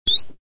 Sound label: Correct Letter; star catcher